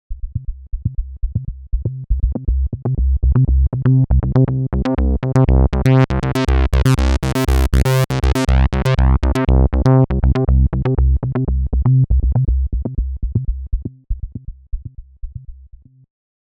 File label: Snappy Envelope; synthmaster